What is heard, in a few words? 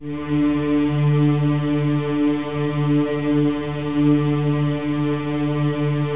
D3, CHOIR; Spectrasonics; Symphony of Voices